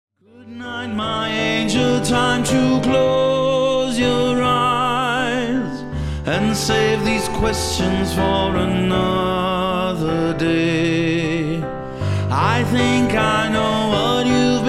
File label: Lullaby Fragment